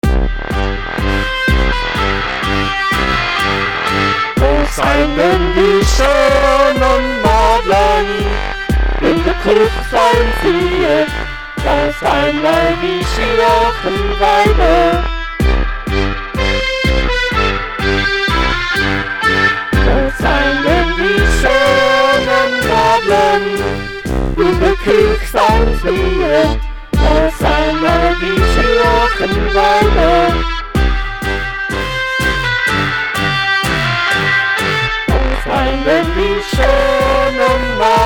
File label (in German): Alpine Volksmusik